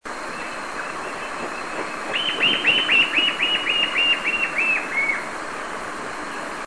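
Chinchero Chico, Lepidocolaptes angustirostris, Narrow-billed Woodcreeper; cantos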